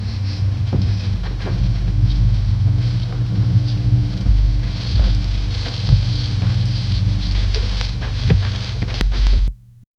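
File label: low ambient train carriage; abstract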